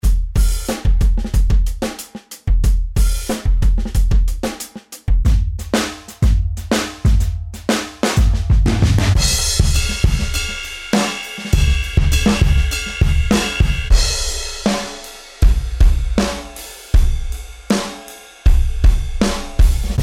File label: Grooves